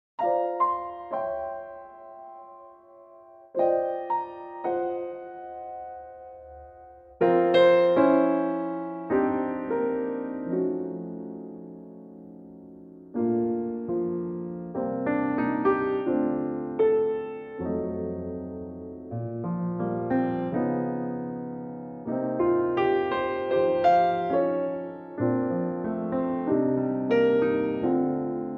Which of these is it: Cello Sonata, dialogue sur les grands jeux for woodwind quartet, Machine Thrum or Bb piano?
Bb piano